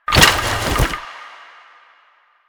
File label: Bunraku Puppet Movement; Warframe; Koumei